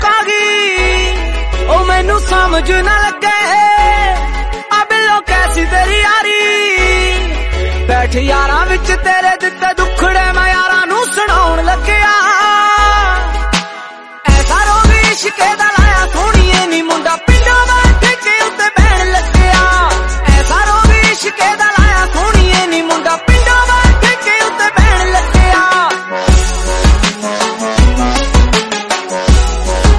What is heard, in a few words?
romantic punjabi